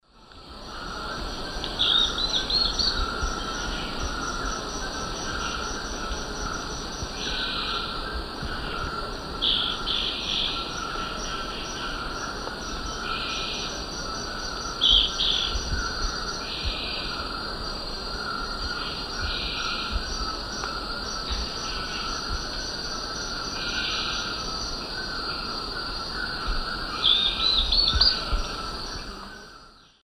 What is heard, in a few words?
Myrmeciza castanea, song and call; S. America; Eastern Lowlands